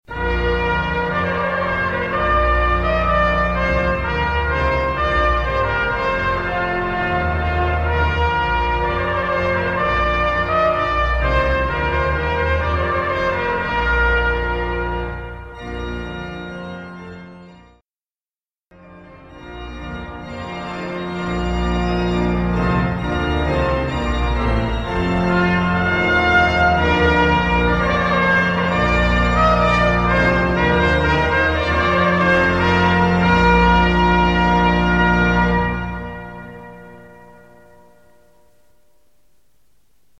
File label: Wedding Music excerpts; Clarke